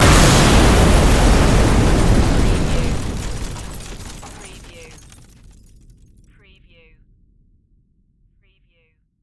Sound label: EXPLOSION BLAST LARGE DEBRIS; lynne publishing